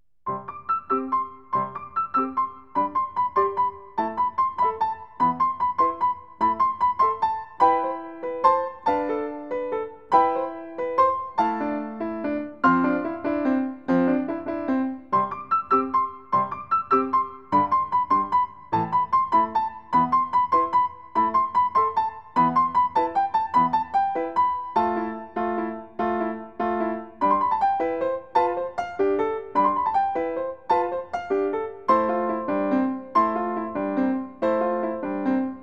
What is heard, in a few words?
Armenian Melody; Piano; Fourth Way